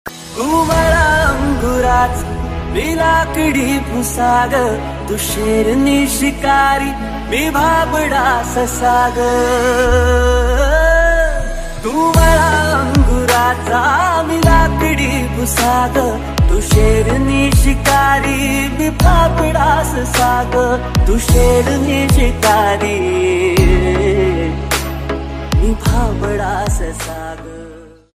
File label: marathi ringtone; tdm movie